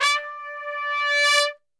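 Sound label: D 4 trumpet; Best Service; Brass Super Section; TRP SWL; 90 s Sample CDs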